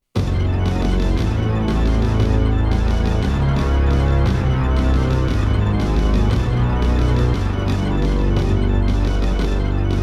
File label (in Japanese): 犠牲の死デモBGM; konami amusement sounds 93 summer